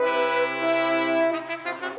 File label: Brass